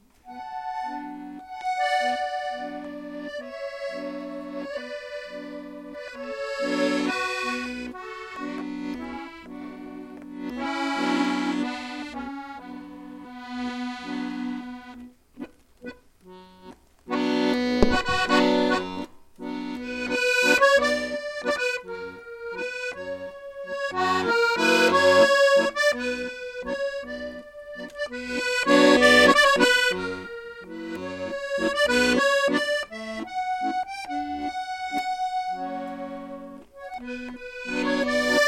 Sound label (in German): drehleier; krimilesung